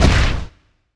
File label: hit